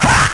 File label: "ha", Voice